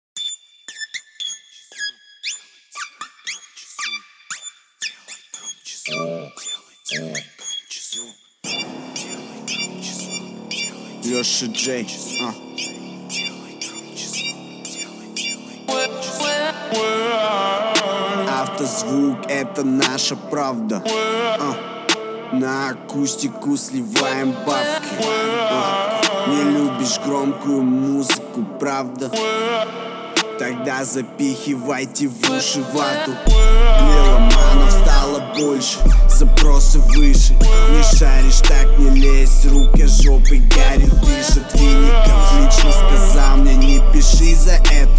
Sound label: 29Hz low Bass